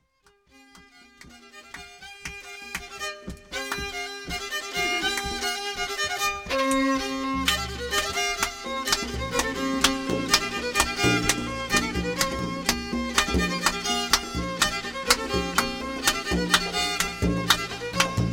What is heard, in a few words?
Fiddle and drum; iona